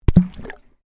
Water Microphone Dunk Shallow Submerged

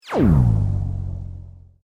Sweep Mechanical Medium

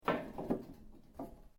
metal rods move; Sound Effects:Materials; Foundation3

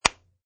Cellphone

